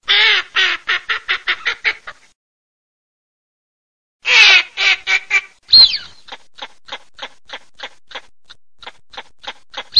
mestolone fisc maschio

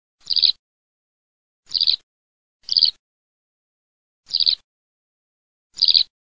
ALLODOLA

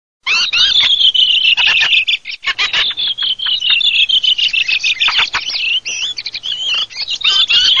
CESENA SASSELLO

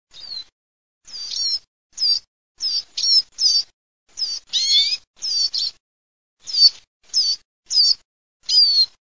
lucarino

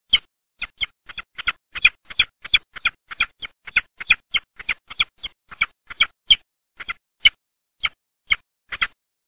FALCO PELLEGRINO, birdsound

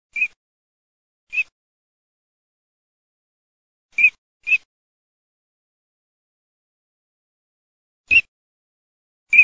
ALZAVOLA

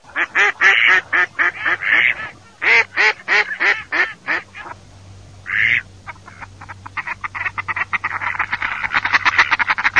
GERMANO

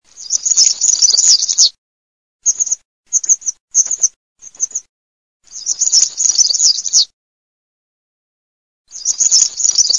PISPOLA GOLA ROSSA

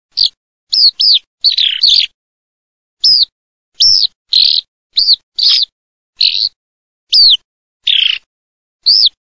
allodola, birdsound

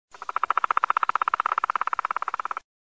PICCHIO NERO, birdsound

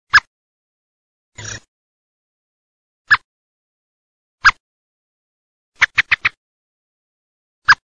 merlo chiocco

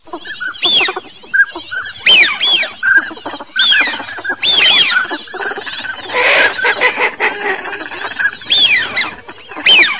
fischio cod, birdsound

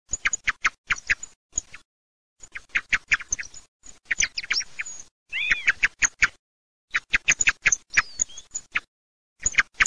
TORDO, birdsound

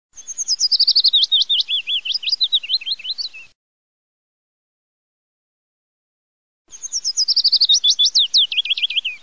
lui grosso

